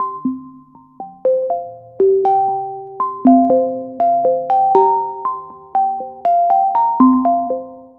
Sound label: Twlight Tinkle, Synth Textures; Sonic Foundry (Sony Creative Software); The Electronic Music Manuscript Collection